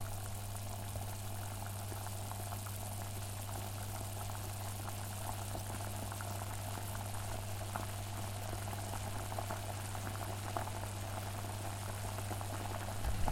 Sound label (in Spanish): voda hirviendo